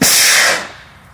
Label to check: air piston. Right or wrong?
Right